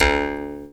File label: D JAW, Primitive Instruments